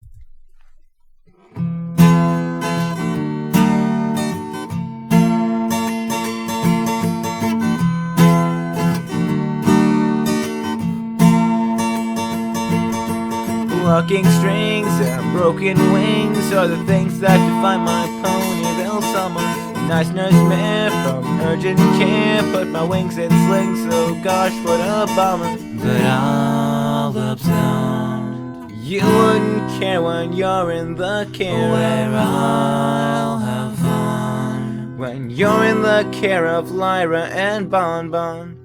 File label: (Summer) (Acoustic Cover); Pony Music Archive